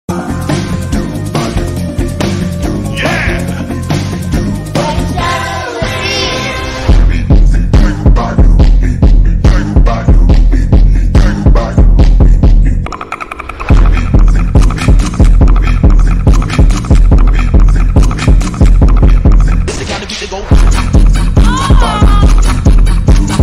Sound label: jersey club slowed reverb